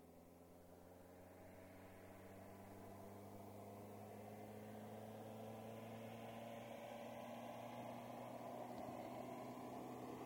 Ford Fiesta, exterior, fast pass right to left (11 litre hatchback); 1.1 litre hatchback, 1986/7 model; Car; BBC